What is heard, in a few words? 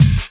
KIT, Dance Mega Drums, HIP